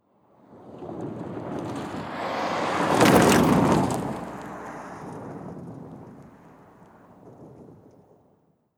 suzuki passing

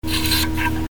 energy and rays

